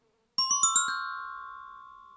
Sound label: Gliding crane